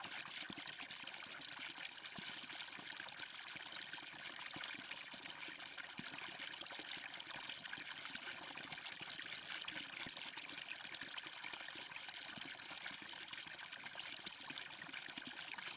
Waterway Waterfall Small; gamerip

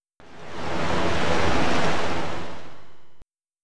Sea Noise; Hey You, Pikachu!; N64

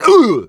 Male Hurt; Mars Sortie

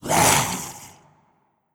Hit Summon monster

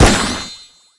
Swimming Start Pistol; MiniGames

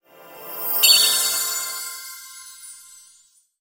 Magic stick; Start Animations Magicstick; hello kitty friends match; android

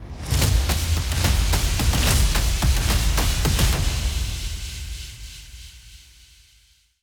Ramp Up; Toronto

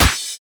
Bop; BopIt DemoSFX Bop Normal

